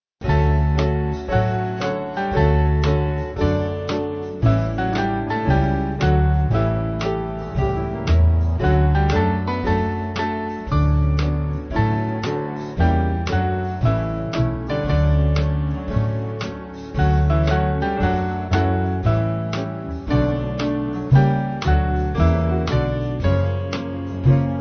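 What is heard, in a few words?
Band, Music Backing; Thine Be The Glory; Maccabeus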